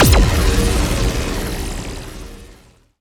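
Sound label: Sci weapon Shot Beam Large Industrial Grinder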